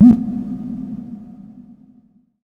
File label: SHORT, SHORTNOIS